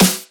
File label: snare